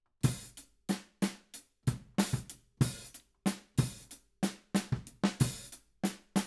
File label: Ride